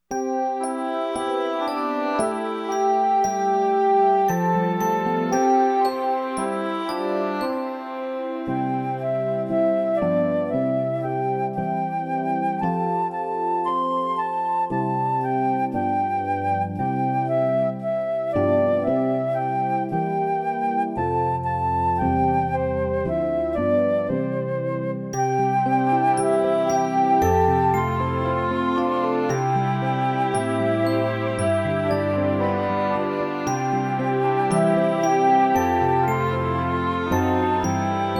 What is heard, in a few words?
Jesus Loves Me instrumental